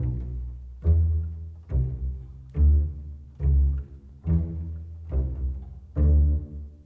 Bass Cello, Orchestral; Sonic Foundry (Sony Creative Software); Orchestral VOL-2

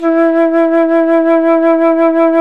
FLT FLUTE, Flt vib; Roland L-CDX-03 Disk 1

